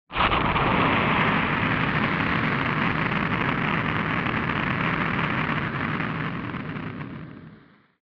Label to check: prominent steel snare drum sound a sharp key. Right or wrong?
Wrong